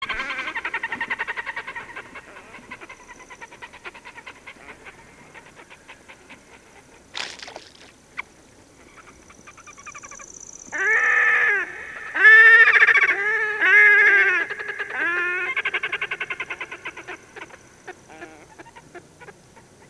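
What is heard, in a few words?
red-necked grebe voice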